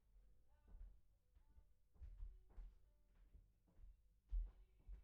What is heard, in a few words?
Piano